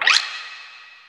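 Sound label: CYMBAL LOOP; SFX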